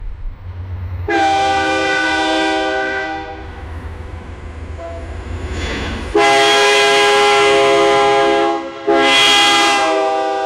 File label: train moving at some poni, railway